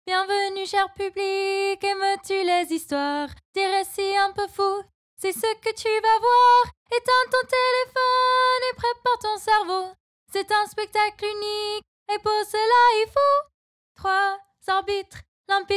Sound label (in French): Hymne Ecolier